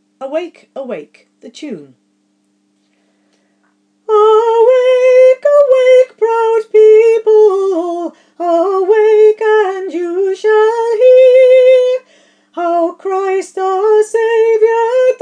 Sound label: Awake Awake tune